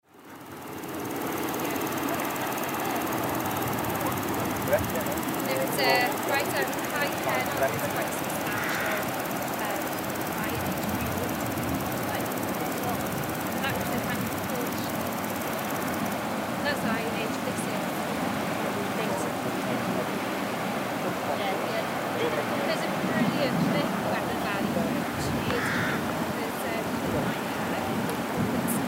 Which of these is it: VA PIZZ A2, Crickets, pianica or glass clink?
Crickets